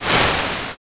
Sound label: swoosh